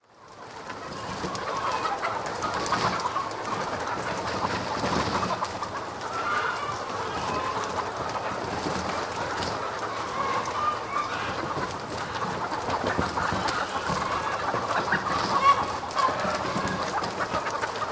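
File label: howler monkey; zvuki kak kudahchet kuritsa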